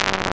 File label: Static